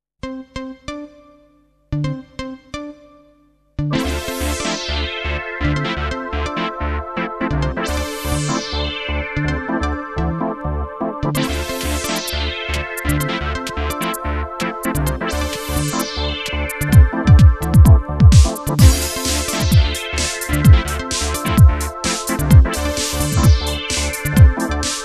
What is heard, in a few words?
High tech